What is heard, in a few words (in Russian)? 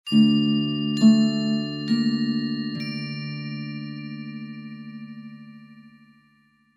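таинственный звук арфы и колокольчиков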